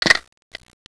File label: Rolleiflex SL66